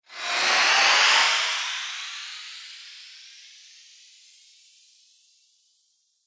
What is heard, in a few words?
Laser Ufo Flyby, sci-fi laser sound effect; Laser Future